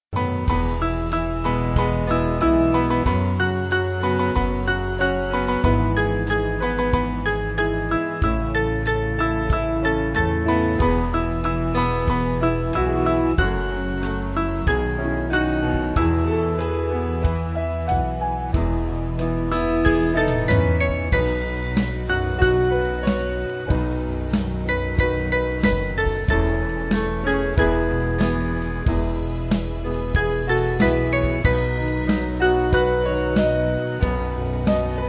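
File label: Band, Music Backing Files for Churches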